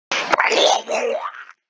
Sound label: ohohohohh